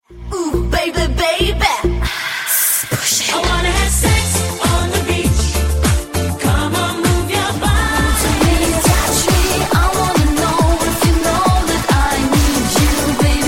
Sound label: dance mix